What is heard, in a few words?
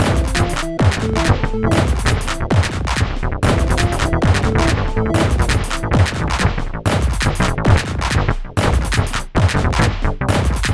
crazy keyboard